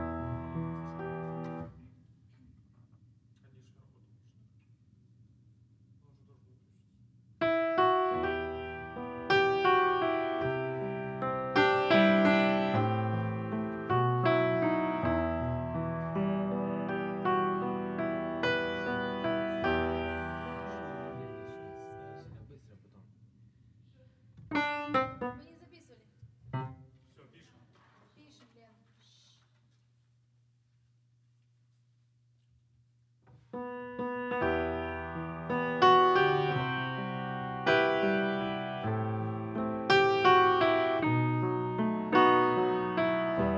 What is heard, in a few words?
piano